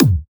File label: Exotica Kick